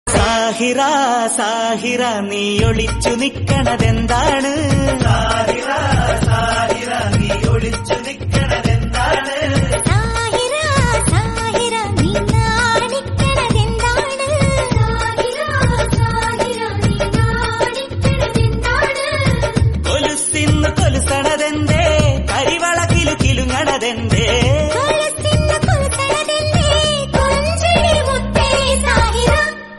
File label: malayalam song